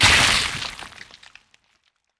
Vampire Tear

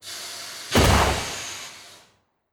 Roaster Over Exp; Legend of Keepers

